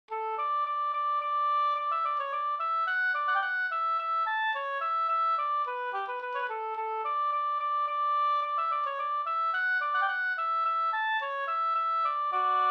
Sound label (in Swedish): slangpolska